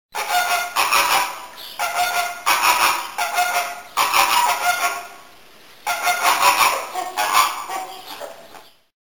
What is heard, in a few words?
flamingo